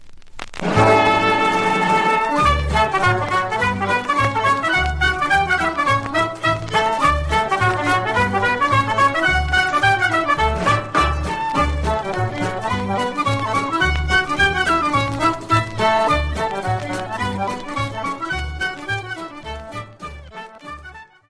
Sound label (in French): Galop - Angleterre; Winster Galop